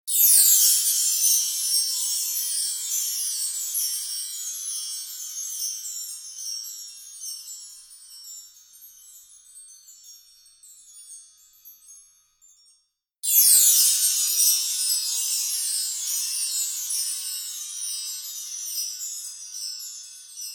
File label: Chimes